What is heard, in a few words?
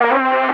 vocal near C; SD AURORA Sample Pack; Slate